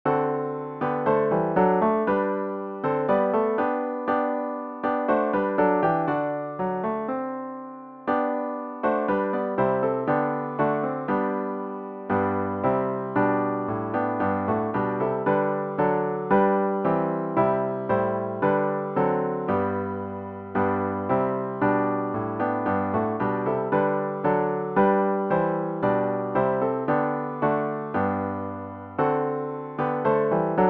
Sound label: Guide Me O Thou Great Jehovah piano with Intro; worship service